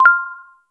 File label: cute dating app notificat; bubbles